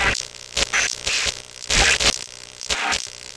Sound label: hologram ad loop